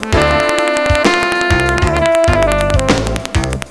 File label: Ocean Verse, Jazz; Sound Factory